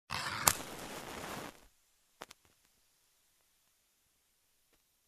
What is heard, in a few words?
Scratching match and inflaming